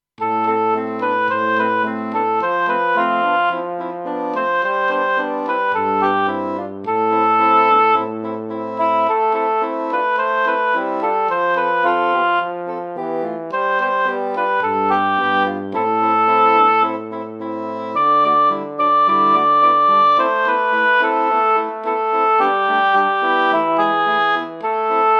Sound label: SATB